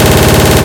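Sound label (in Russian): длинная очередь из минигана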